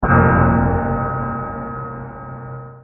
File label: guitar rev